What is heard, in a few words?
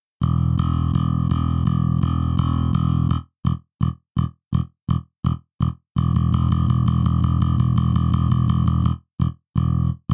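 Flame Bass Default setting pick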